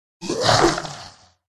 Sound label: skeleton attack voice